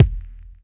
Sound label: BD, Bassdrums, Roland TD-20